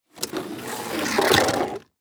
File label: Impact Plastic Drag On Cement; Impacts